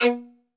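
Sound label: solo violin, strings; ILIO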